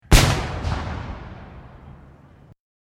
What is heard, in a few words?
artillery cannon; secondlife